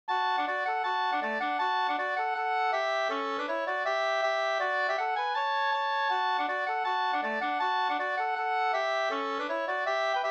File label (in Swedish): reinlender fr Norge